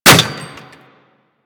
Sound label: Bullet Impact Metal No Whizz; Warframe; Bullet Impacts